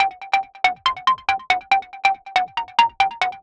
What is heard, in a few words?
Noisynth; Сэмпл синтезатора (Техно-транс); Techno Trance